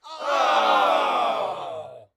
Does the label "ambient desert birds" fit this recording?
No